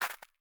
Jump; MiSide

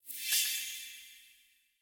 Food Cut Done; Game rip; MiSide

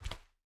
Barefoot; MiSide

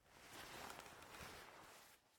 Sit Standup; game audio; Mita; MiSide

